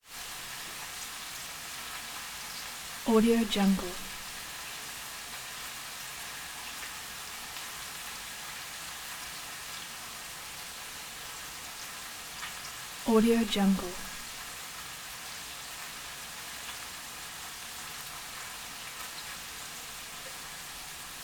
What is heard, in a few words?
household cooking fry cooking sizzle; domestic sounds